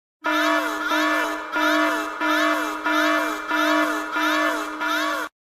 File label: Auhhh auhhh auhhhhh